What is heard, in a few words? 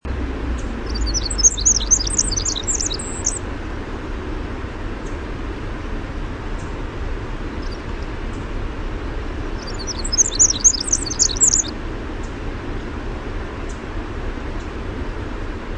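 Winter Wren song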